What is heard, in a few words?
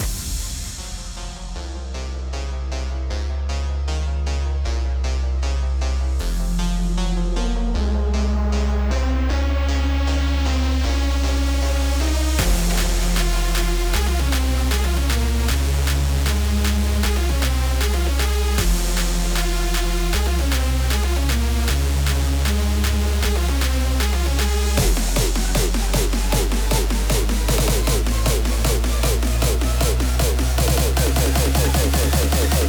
Kit Cmin 155 Unmastered; Kits; GhostHack